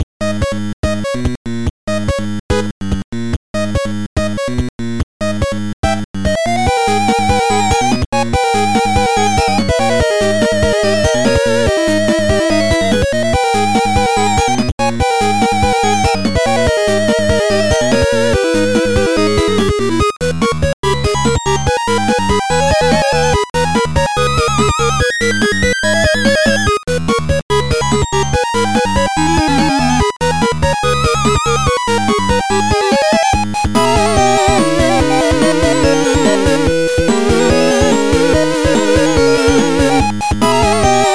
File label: 8bit